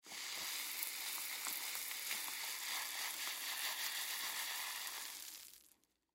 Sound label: Rock Salt Pour Shake, Rock Salt Pour Shake Bag; Materials; Foley; Blastwave